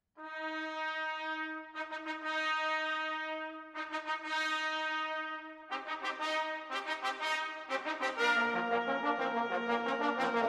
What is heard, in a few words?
Fanfare